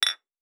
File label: Glass; Survival Game Sounds - Lite Edition